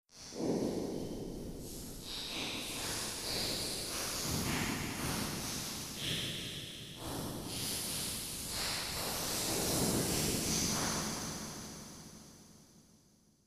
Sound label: widespread hall 100 wet, reverbs; zroom